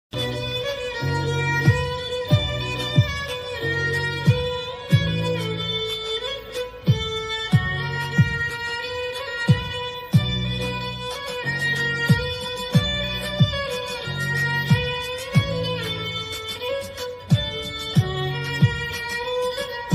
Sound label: Violin Ringtone